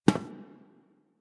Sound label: NPC Step Default, Footsteps